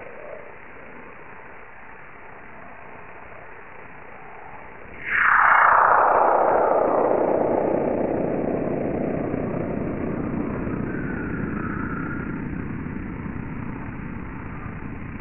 solar burst type3; uiowa

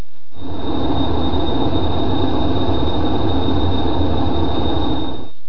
radiation2 73k

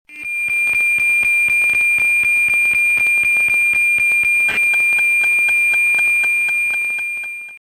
mode fax meteosat

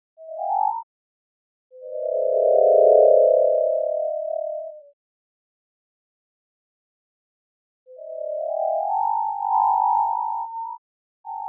solar burst type3; messmer

